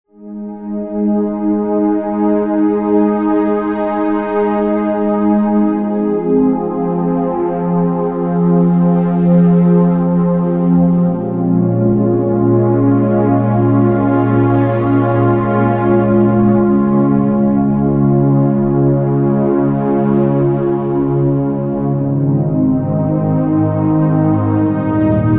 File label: Underscore PAD; Worship Flow